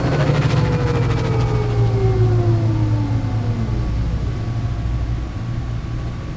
Ship end; vehicles

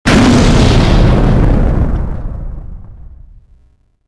explosion huge; weapons explosions

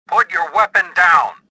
anger

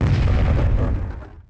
knippel 2 bort; shipcharge; Pirates Of The Caribbean

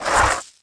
gold coins revert; terrains; Tony Hawk's Project 8; XBOX

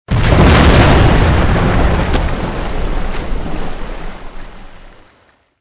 debris splash; Soldier Of Fortune II Double Helix; XBOX

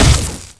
Cosh Impact, Electric Cosh

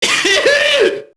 junkie get hit; enemy; Max Payne

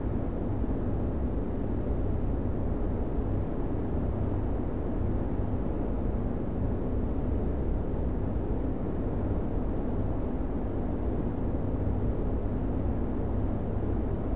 deep mine shaft ambience; Star Wars Obi Wan; XBOX

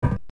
clank, footsteps

